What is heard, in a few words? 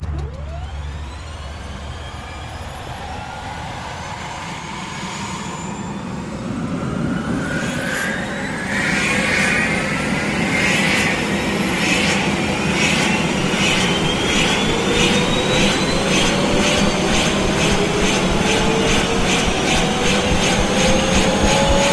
ah1 start, heli; wac